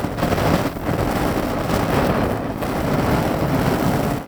Siege Engine Cannon Projectile Loop; Weapons; Enemy; Artillery; Ode Siege Engine

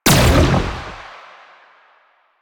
Gunbrella Shot Laser, Weapons; Warframe; Tenno; Koumei

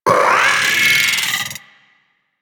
Vocal Attack; Warframe; Amalgam Moa

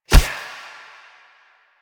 Choir Bow Quick Fire; Warframe; Weapons

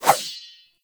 Rapier Whoosh; Swords

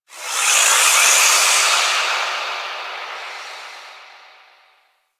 Moon Wind Small, Ambience; Warframe; Orokin Moon

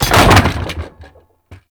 Debris Metal Wood; ambience; Warframe; Entrati

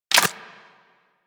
Bullet Impact Debris Wood

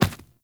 Medium Run Default, Footsteps; Warframe; Infested Footsteps Medium

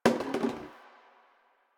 Janitor Cart Plastic Bottle Drop; Warframe; Ambience; Nineteen Ninety Nine

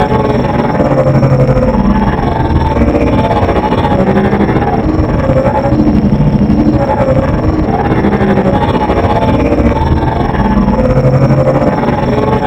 Super Drone Osprey Engine; Warframe; Corpus

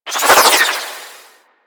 Arch Wing Prime Dodge Roll Left; Warframe; Vehicles

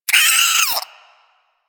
Vocal Pain, Hunting Critters; Warframe; Cryptilex

